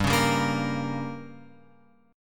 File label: G Diminished; Gdim Chord {3 4 5 3 X 6} strummed